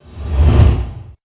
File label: Medium powerful whoosh transition